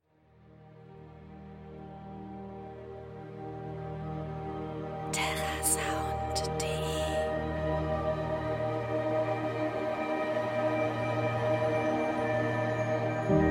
forming a tune; terrasound

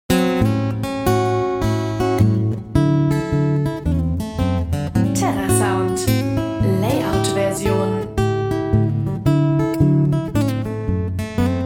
2 hands blues; terrasound

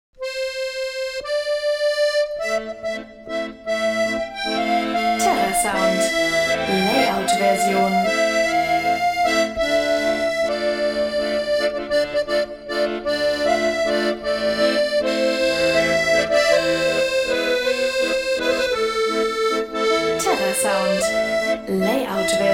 french accordion solo; grm; terrasound

